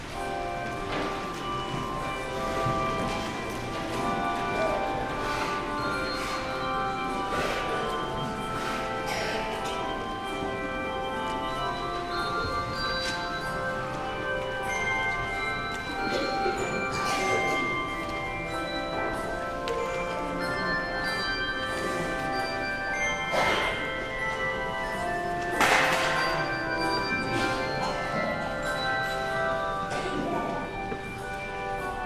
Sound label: Handbells; When I Survey